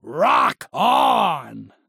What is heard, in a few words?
slap spring